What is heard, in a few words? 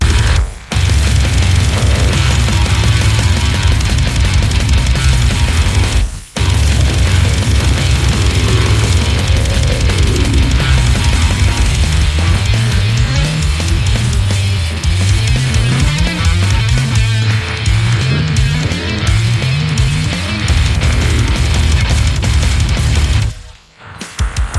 instrumental